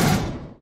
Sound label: rifle, Gunshots; Weapons